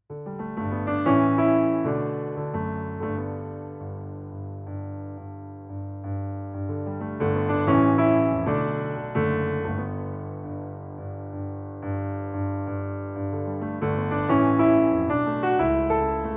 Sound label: Japan Grand; DEXIBELL COMBO J7; organo digitale